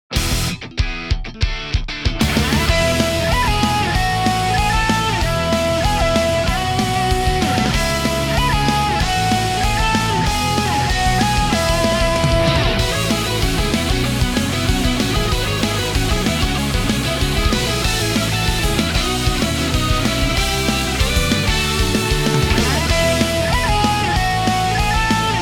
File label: Field Rock; Pokemon Masters EX; Purin